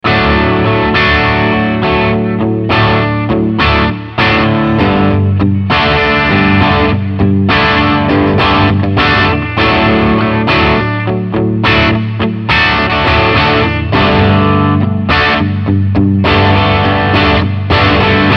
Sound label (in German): Crunchsound